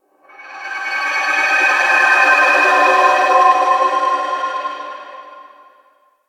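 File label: Drifting Texture; Warframe; Ambience; Entrati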